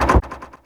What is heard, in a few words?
Clam